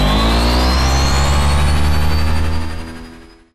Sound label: power up special; Titanfall 2